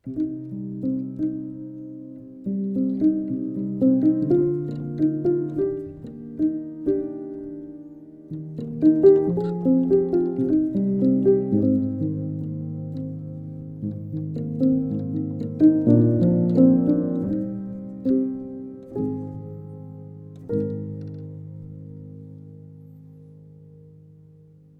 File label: upright, Royer r121 mk4